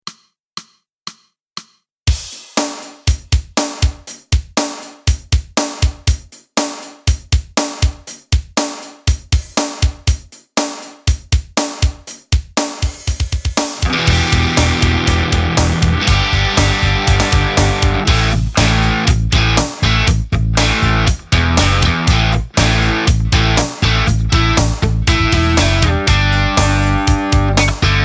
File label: Heavy Roll